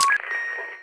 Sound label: intercom; Cosmic Rage; general comms